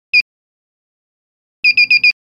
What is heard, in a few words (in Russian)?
Разблокировка 1 короткий 4 коротких